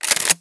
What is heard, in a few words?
nova bolt, weapons; csgo